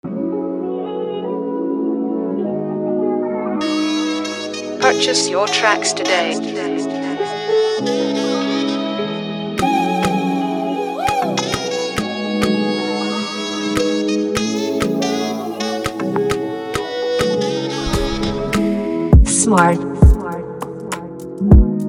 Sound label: TYPE BEAT, afrobeat instrumental